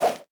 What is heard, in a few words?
swipe; Animation Throwdown; cardbabies